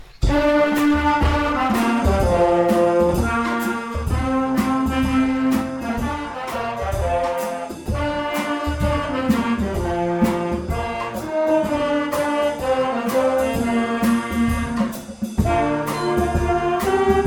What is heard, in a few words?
bigband